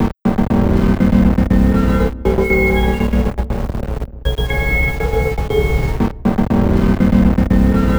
Envelope Beat; Drums & Drones

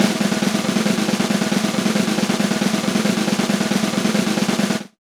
Rock Snare Roll, Roland BK-5; Snares

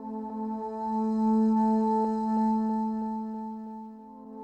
Kelele, Kelele Drones; World Percussion

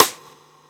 Rimshot; Equipped Music; Loungin House

